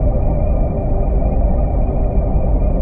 engine; Earth and Beyond; EA Games

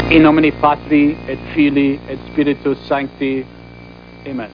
heal; dangmer

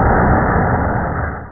fireball

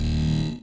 New Level Shield; PC Gamer IT CD 32; SWARM

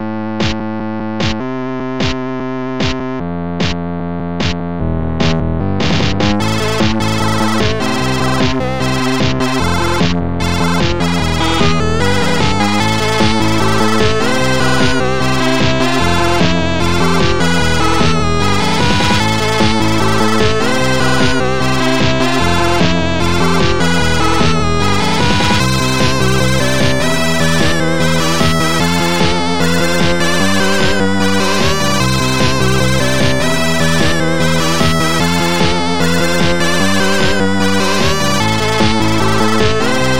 WARNING

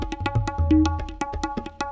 PERC LOOP ETHNO 125; eJay

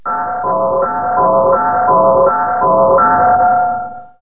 SPACE CHIME, STRANGE

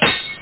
attack

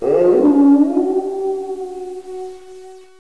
Wolf Howl; Curse of Dragor

